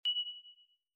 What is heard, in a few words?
High Single Ping